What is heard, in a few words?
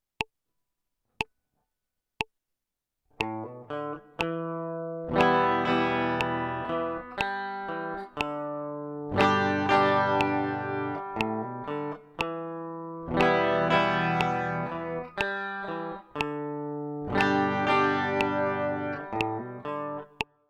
intro melody em g